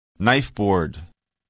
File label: knife board